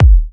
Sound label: clean bass drum sample g key, steel kick drum sample tuned to the G note